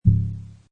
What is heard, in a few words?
Subtler, Scifi, understated synthetic deep ping sound; Button clicked or science instrument turned on/off.; Science Fiction Sound Effects